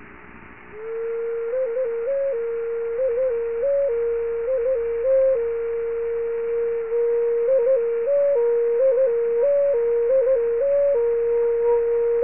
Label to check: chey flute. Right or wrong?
Right